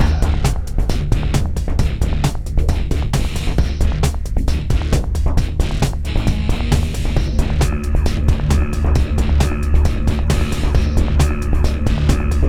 Ghost Round (Loop); Buried

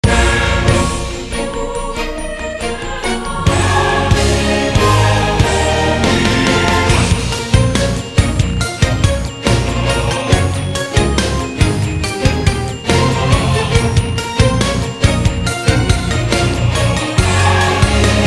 Menu Start

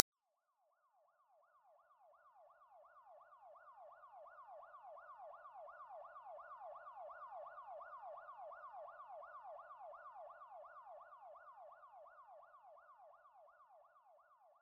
Ambiance Random

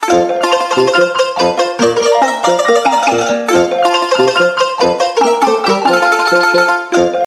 Jingle Mail; Taiko no Tatsujin DS Touch de Dokodon

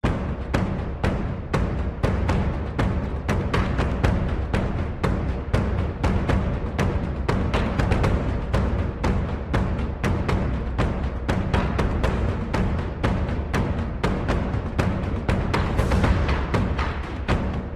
music battle

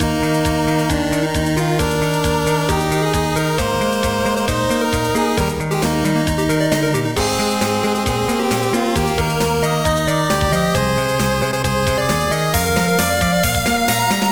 Chiptune the Stars Intro; Voidspire Tactics

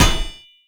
Manhole Close; Levels Hub Diner; Light Buford

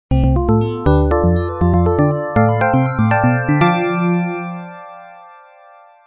Level Completed!

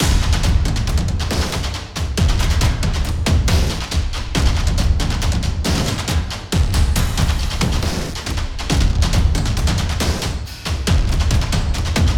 Boss Transformed PERC; skykeepers; ps4 windows xbox one gamerip 2017

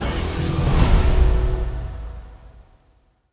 battle end open victory; Iron Tides macOS Windows gamerip 2017

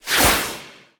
Sword; ChooChoo Heroes

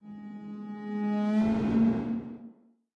Ui Range Up

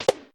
Stomped

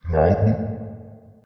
shadow leave; Five Nights at Ronald's

